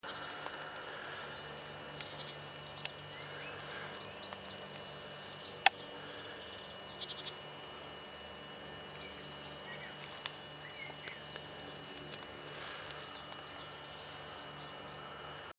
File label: Baa; Aylesbury trip; Some quick sound bits from the Aylesbury trip.